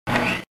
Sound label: fist; attacks